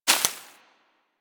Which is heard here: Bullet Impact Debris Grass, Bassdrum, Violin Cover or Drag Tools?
Bullet Impact Debris Grass